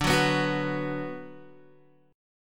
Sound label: D 6th D down Guitar, chord; D6 strummed; JGuitar